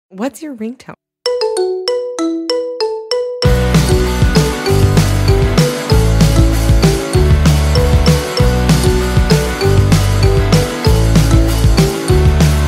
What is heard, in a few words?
marimba remix ringtone